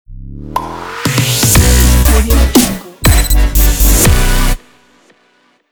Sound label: future bass ident